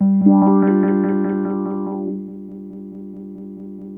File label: Rhodes; RHODES WIN; E-MU Producer Series Vol. 8 – Platinum Phatt; EP FX